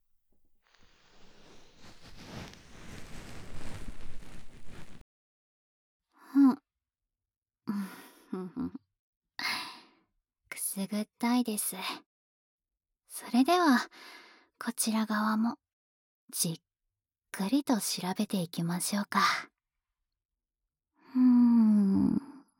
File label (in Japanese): 耳マッサージ, 右, ASMR